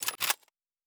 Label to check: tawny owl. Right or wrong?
Wrong